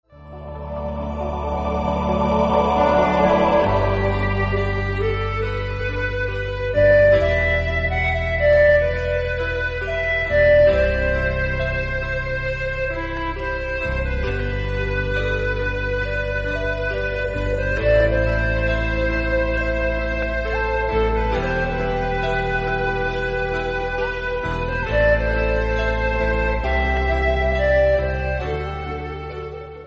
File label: Ringtone Mobile